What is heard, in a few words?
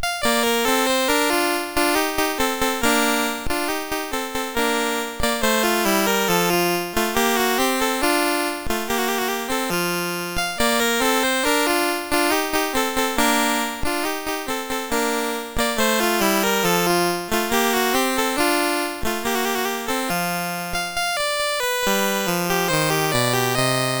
Allegro in B Flat Major, MOS6581R2